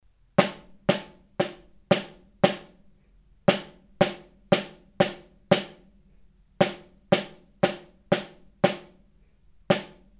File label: snare